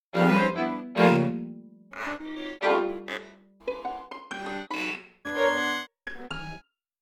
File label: quartet; instruments